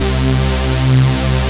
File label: BIG POLY SAW, SYNTHS; Pro-Rec - Synthsonic